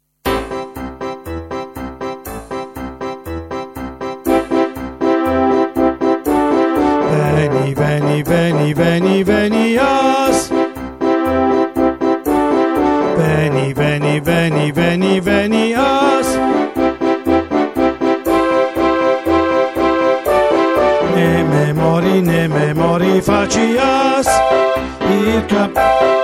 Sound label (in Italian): bassi coro Veni, veni, venias; Carmina